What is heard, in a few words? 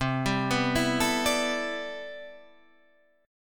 C Major 13th C, Guitar DADGAD